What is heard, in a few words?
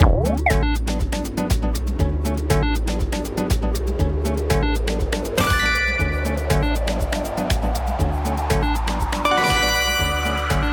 megawin; Lucky Fortune; wazdan